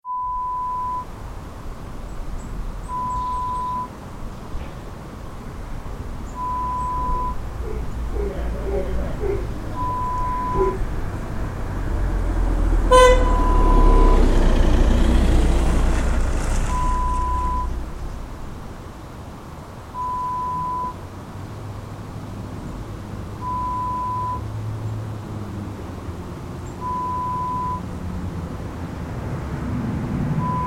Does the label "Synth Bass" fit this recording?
No